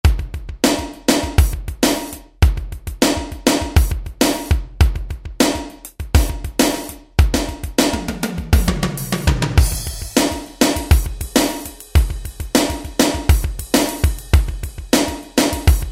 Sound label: Mega Funk, Alesis SR-16